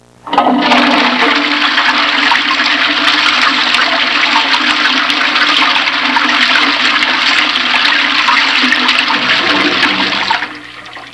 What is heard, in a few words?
TOILET; HAUSHALT